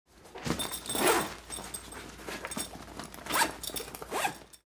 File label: Zipper on a cloth bag zip up